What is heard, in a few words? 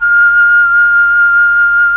FLUT1; ILIO; Propeller Island Legendary M400